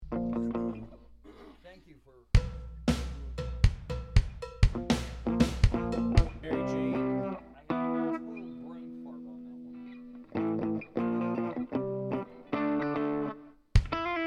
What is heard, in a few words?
Vocals